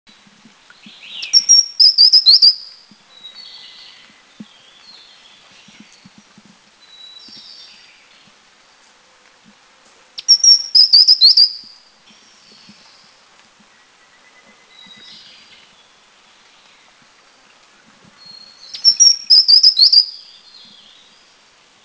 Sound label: Pygmy Wren Babbler